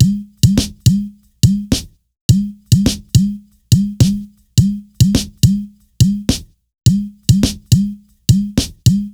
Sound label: Bash, Breaks, 105BPM